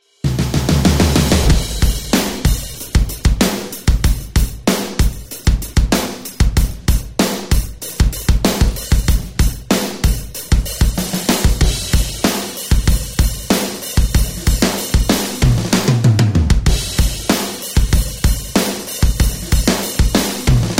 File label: big rock drums; master demo